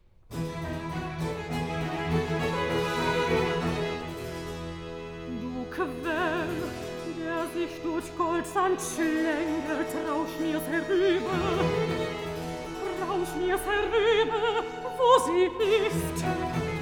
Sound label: cantata for soprano & orchestra; Die Amerikanerin